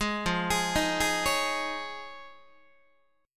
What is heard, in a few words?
F Minor 7th Sharp 5th Guitar, chord; Fm7#5 arpeggiated; 11,13,11,13,12,12; 4A,4E,5A,5D,5G#,6C#